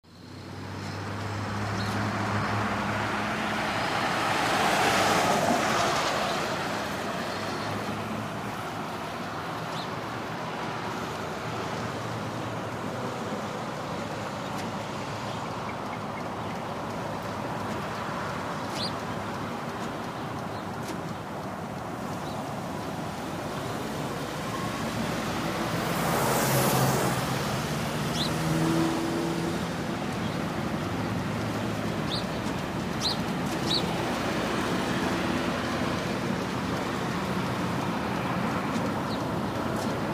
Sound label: Street after rain